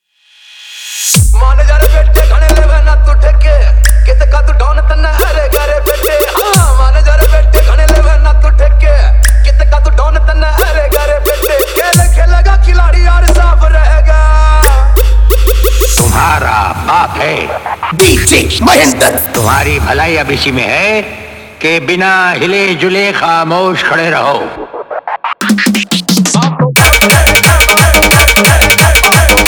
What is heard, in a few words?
Hindi Remix Song